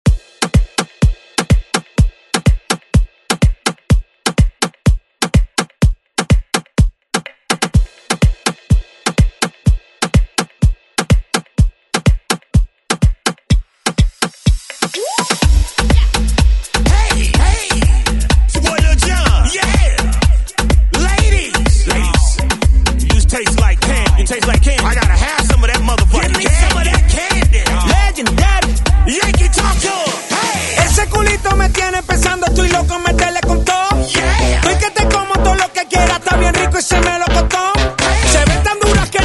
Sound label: Re Drum; DJ Mhark